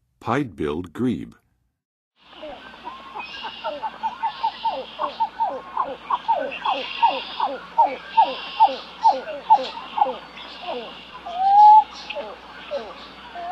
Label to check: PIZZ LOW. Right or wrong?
Wrong